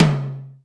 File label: AMB HI CLR, TOM; East West - Global Sounds; D4 BD SD TOM